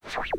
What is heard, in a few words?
Rotor Left; Salem's Sound Schemes